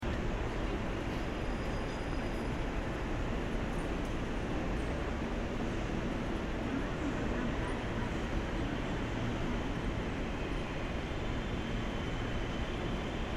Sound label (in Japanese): 駅構内の広い通路の環境音